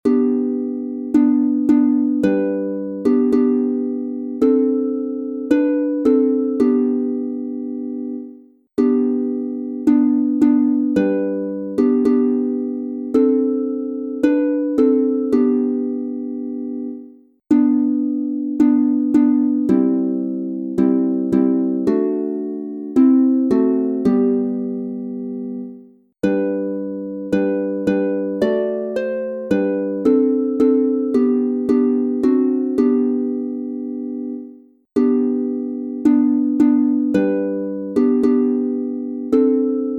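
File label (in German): G Dur 3 stimmig instrumental, Harfe; Marienlieder; Glorwuerdge Koenigin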